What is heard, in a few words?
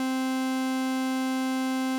ARP 2600 SQUARE